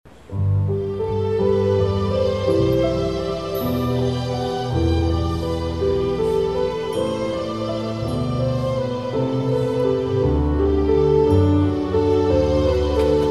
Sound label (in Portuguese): Piano e Cordas; Jesus alegria dos homens